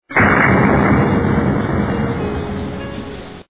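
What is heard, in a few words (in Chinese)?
qiangpaosheng, gunshot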